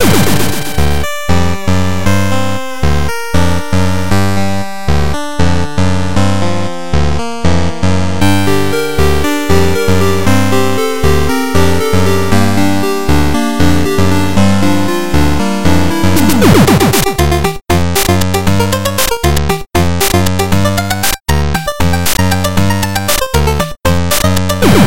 Forest Funk; Super Meat Boy; 24 dB soundworks; RETRO; Ch 1 Warp Zone

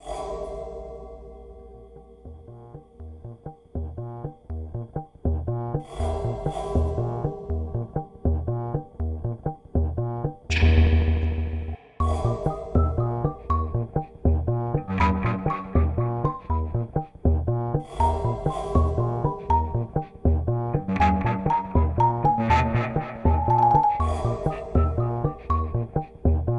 Music Exploration Short Full

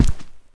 Footsteps Rug; backrooms 2d android ios online windows gamerip

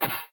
Metal Impact

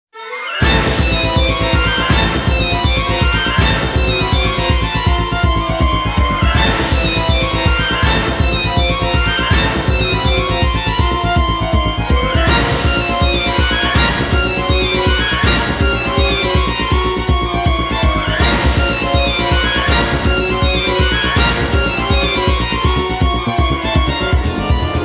frantic tune bpm 162; genso suikogaiden vol. 1 swordsman of harmonia

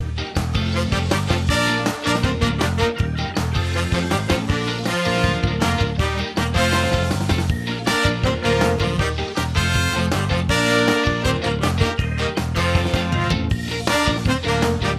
reward music body

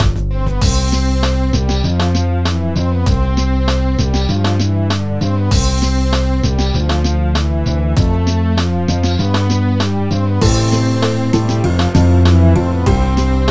Music CASUAL; Super Scribblenauts 2010 NDS

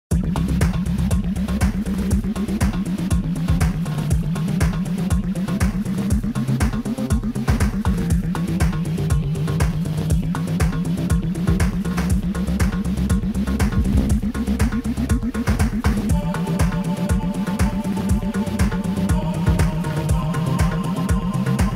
Threat - Heavy Industrial; Rain World

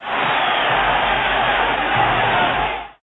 Victory

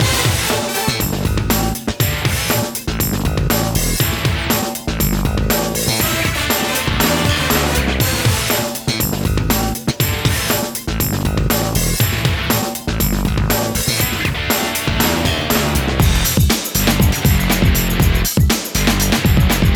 fanfares

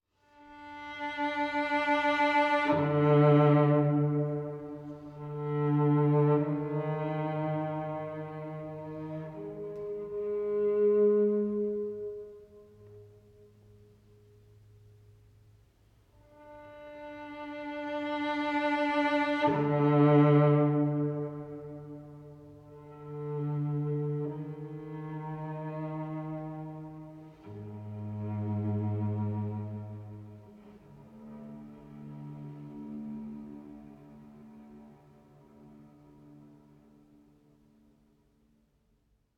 Shore Music

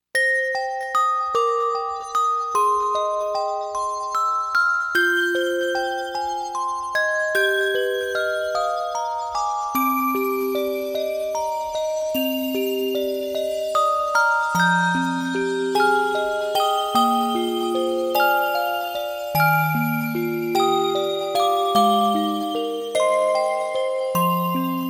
Orgel; Genepe

